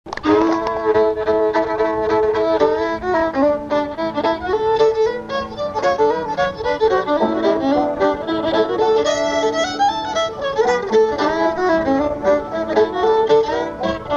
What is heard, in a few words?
Tune; John Sharps